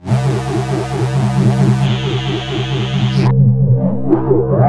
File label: fall, Synth